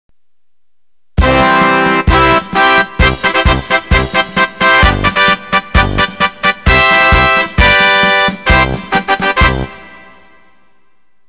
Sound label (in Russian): Туш